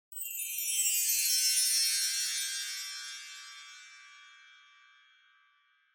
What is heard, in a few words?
Wind Chimes, Reverb Slow Down Low